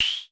KLONE NOIZ, Klone Dual-Percussion-Synthesiser, HHAT; Drum Machines